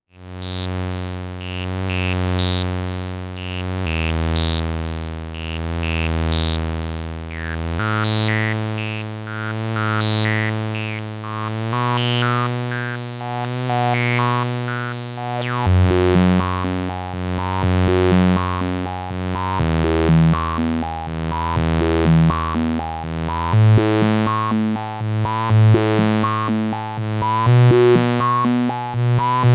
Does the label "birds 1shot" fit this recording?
No